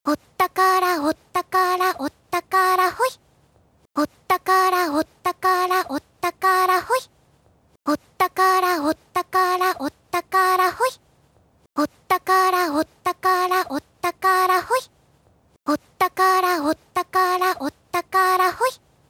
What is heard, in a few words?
RINGTONE